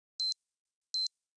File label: c4 beep; czero opforce